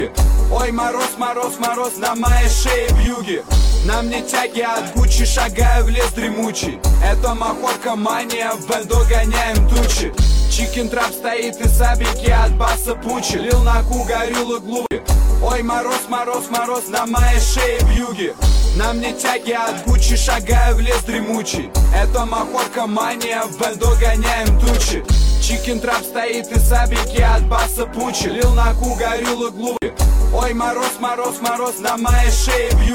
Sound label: trap